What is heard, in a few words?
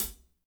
HAT R, WARM BALLAD; Spectrasonics; Burning Grooves CD2